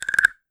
Perc Wooden Frog

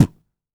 kick; foxdot default

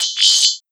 Perc Metallic; Granular Sumo

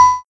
Bell Synth; Synthie V1

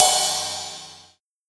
Crash Short; pitchglitch

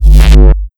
Sound FX Synth Buzz Granular; Sumo

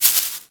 Perc Shaker; pitchglitch

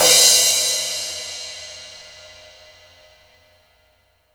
Cymbal Long; BJAPacific; pitchglitch

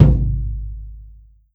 Tom Low Trash

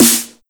Snare Smash 909; Adriak 909

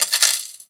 Cutlery

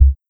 Kick; Grammy Grim; Ghost Fader; pitchglitch samples

